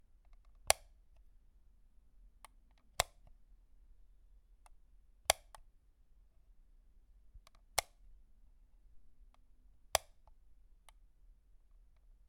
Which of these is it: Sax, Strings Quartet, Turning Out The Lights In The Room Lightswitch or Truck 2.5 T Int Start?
Turning Out The Lights In The Room Lightswitch